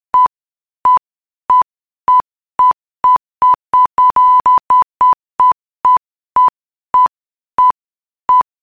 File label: Pulse Varying Period